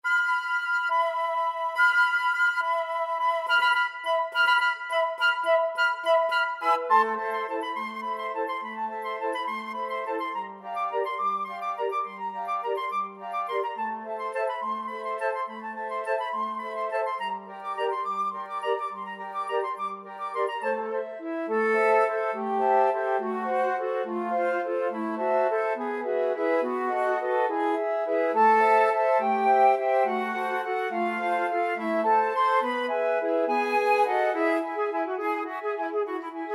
danse macabre, flute choir

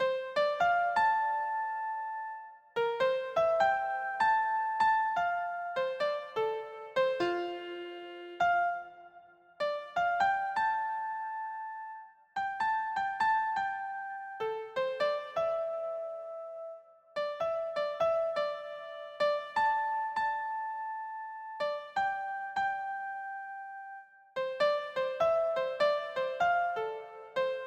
keyboard

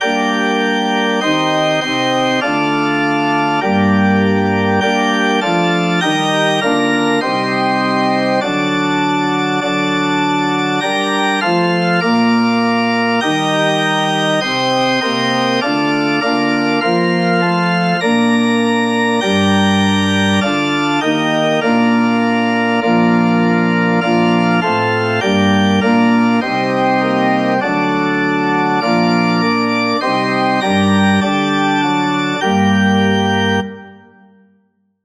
ORG, organ

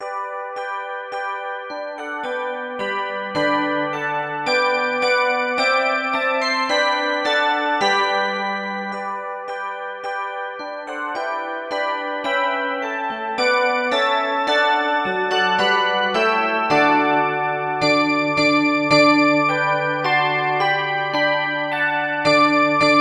Hark! The Herald Angels Sing, handbells

